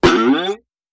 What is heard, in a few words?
Boing Up